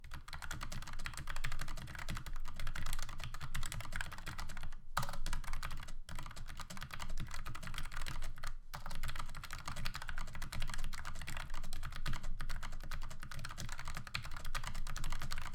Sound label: Logitech MX Mechanical typing sound